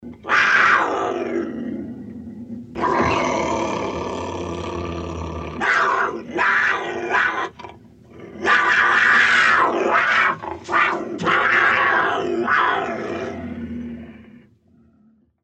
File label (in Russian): Puma